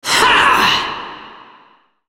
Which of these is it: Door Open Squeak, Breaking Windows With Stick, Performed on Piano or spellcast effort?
spellcast effort